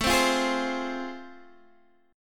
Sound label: A Minor Major 7th Sharp 5th, Guitar DADGAD